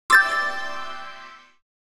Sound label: Email, Nokia tones digital; N85